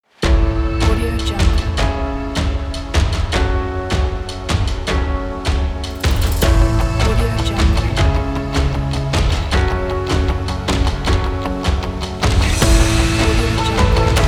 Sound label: chinese travel; sieepwalker